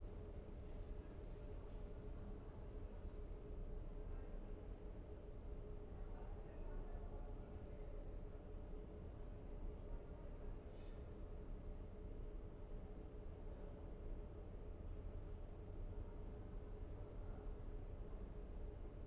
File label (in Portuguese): Ambiencia plenario vazio com ar condicionado e pessoas longe; ambiente interno